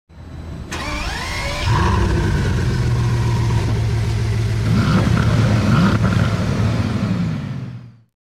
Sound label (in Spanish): encendido motor v8; android